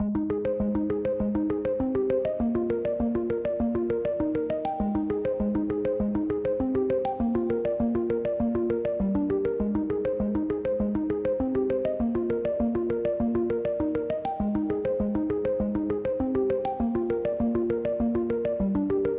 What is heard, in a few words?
Pluck Arp Pop Cm 100; Sample Banks; Melody; Keyboards